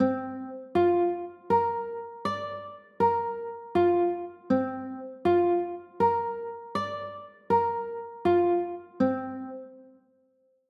c 6, chords

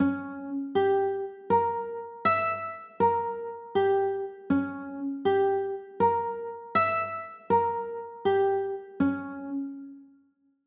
c sharp dim7

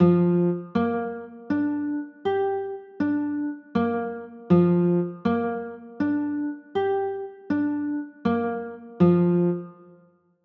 b flat 9, chords

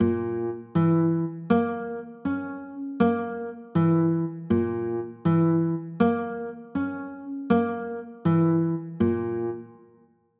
b flat dim, chords

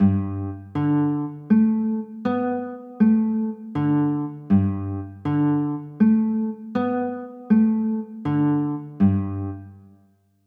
g add9, chords

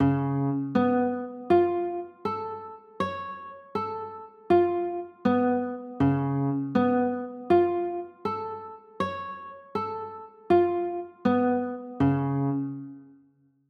d flat 7 sharp 5, chords

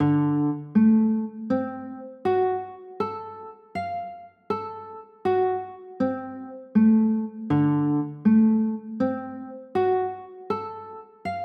d 7 sharp 9, chords

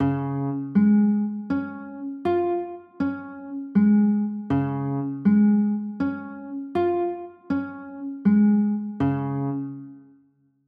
d flat maj, chords

